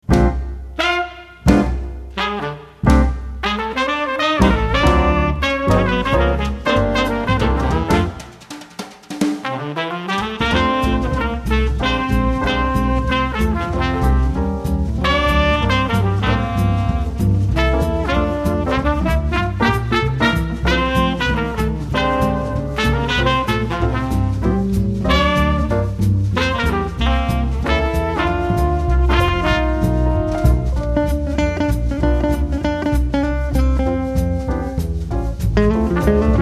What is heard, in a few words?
Jazz Quintet Sax Trumpet Piano Bass And Drums